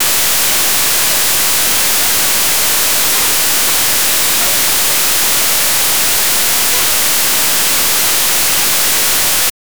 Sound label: atari pong score; arcade